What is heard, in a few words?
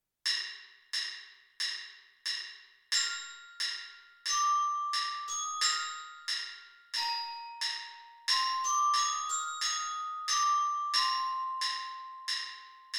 orchestra bells part a